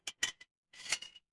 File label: glass ceramic